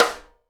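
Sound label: SIDE STICK, Live Snares; E-MU Producer Series Vol. 8 – Platinum Phatt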